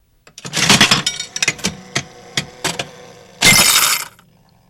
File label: Slot Machine Lever Ru